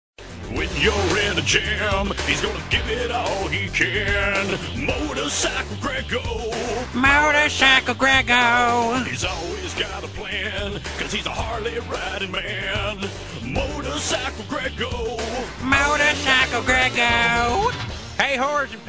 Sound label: fake motorcycle